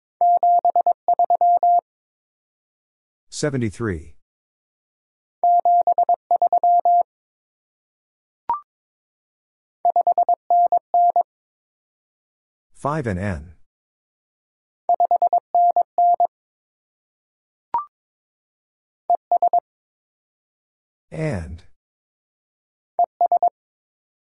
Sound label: Top 20 QSO Elements - 22wpm, morse code practice